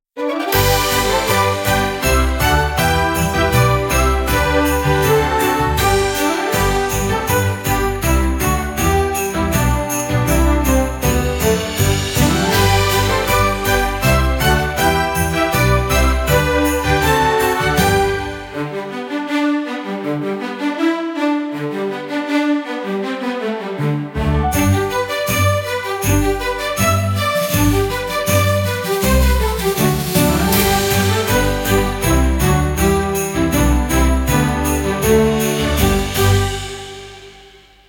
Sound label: 80 bpm Happy Orchestral Christmas; 39 sec; ai social media music